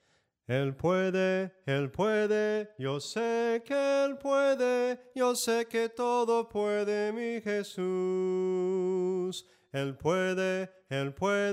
SOPRANO CANTADO, himnos en melodia soprano

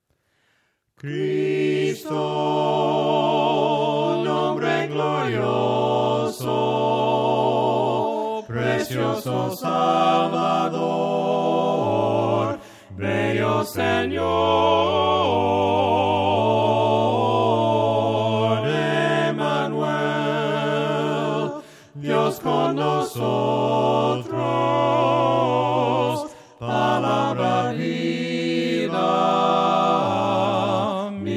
CRISTO NOMBRE GLORIOSO VOCES, himnos a capella; cantos del camino

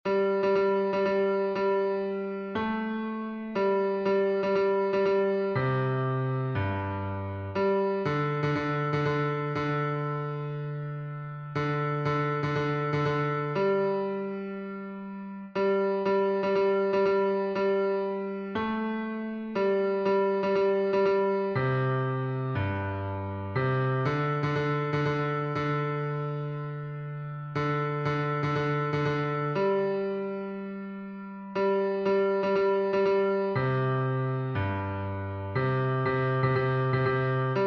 bajo, himnos en piano; 95 EL CIEGO BARTIMEO; cantos del camino

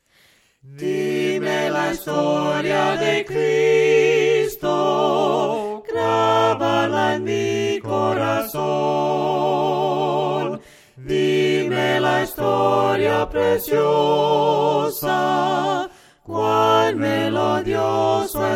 VOCES, himnos a capella; cantos del camino

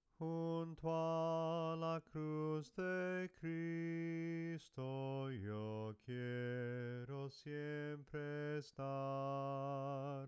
JUNTO A LA CRUZ DE CRISTO, himnos en melodia soprano; cantos del camino

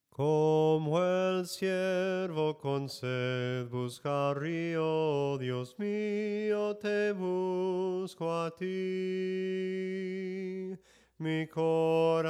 SOPRANO CANTADO

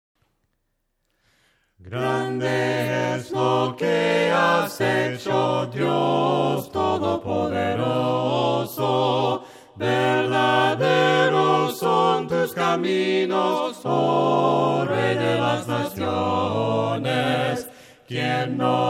GRANDE ES LO QUE HAS HECHO VOCES, himnos a capella; cantos del camino

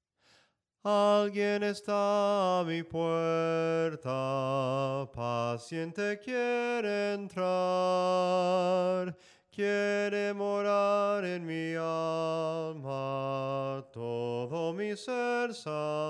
SOPRANO CANTADO; ALGUIEN ESTA A MI PUERTA

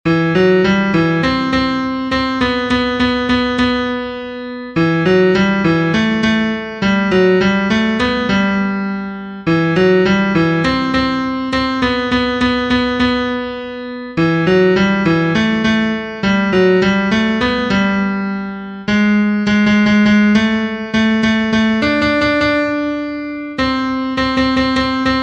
TENOR; ALABANZA

